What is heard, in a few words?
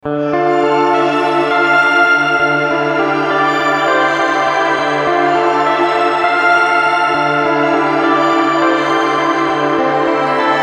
Shimmer Reverb